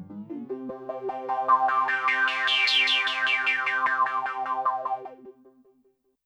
Trance, YAMAHA RM1X

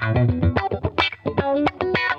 Funk Guitar; 90 s Sample CDs; Zero G

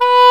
OBOE B3; WIND

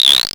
SERGE Guiro, drums; Polyend; Stazma & Concrete Collage; Concrete Collage Drum Kit; Concrete-Serge Drums

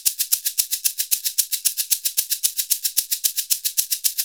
SHK, Shaker; Univers Sons; Basicussions

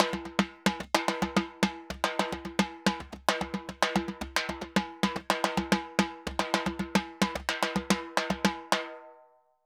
Timba Murga 110 bpm; Best Service; Festa Latina VOL-1

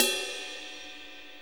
Deep Bell Ride, Cymbals; Sonic Foundry (Sony Creative Software); Loops & Samples Drum Tools

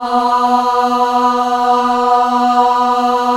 B2 WOM AH, Choir; USB Soundscan vol.28; Acoustic & Synth; AKAI

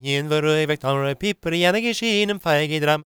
GAELIC, World Voices; ILIO; Vocal Planet

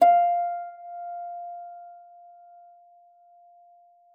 HARP E5; MF; Xsample VOL-8

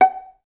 VAS PIZ F#4 L, Orchestra; Best Service; Peter Siedlaczek's Advanced Orchestra

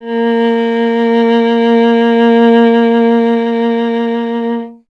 STR VLA, Viola; Miroslav Vitous; Solo Instruments VOL-1

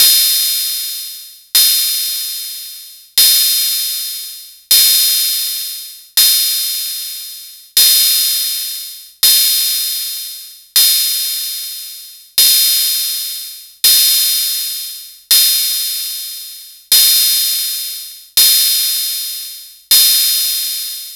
open hat, raw

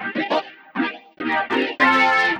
Metafunk, Leads And Riffs

